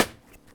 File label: Snare, drums; Ableton Live 12 Lite Core Library